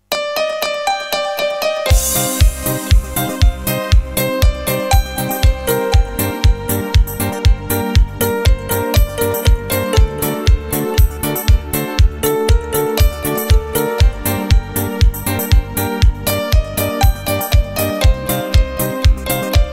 remix korg pa 600 cover

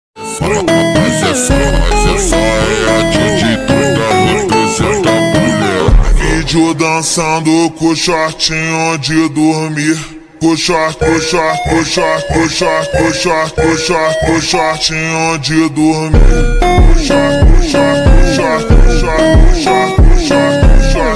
phonk

